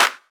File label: sharp clap sample e key, Royality free clap sample tuned to the E note. Loudest frequency: 2792Hz; percussion; single hits one shots